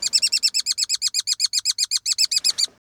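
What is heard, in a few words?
Tree shrew Neg Low Squeak long distance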